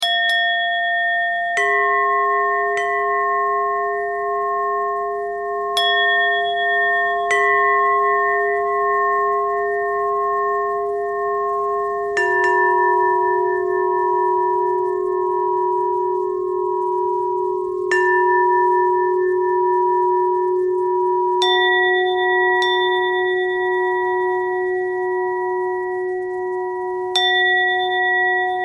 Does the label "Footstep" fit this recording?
No